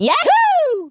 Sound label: yahoo; Toad; sm64coopdx